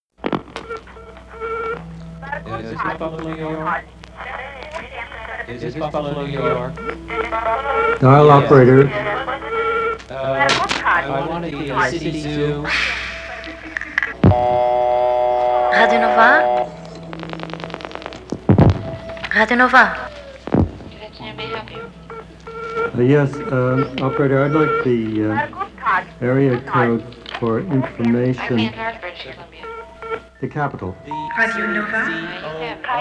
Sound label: Radio Nova Jingle; Nova 25 ans - 1982